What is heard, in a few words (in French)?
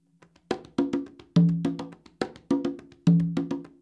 rumba ternaire, congas